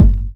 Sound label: Kick; Ableton Live 12 Lite; Core Library; Drums